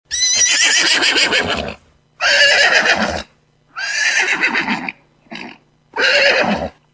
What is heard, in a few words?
HORSE